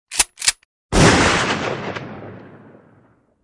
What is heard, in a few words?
Shotgun